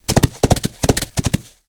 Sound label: Four Hooves Attack